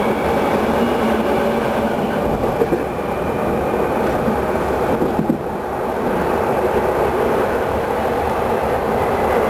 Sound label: Motor, Train; RBDe560